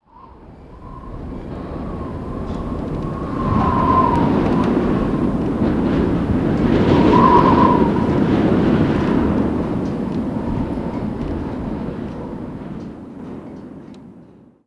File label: wind gust, ambient